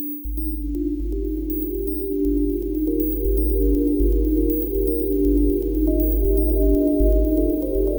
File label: Pad, Synth; Nausia Pad Split; Ableton; Factory Packs; The Forge by Hecq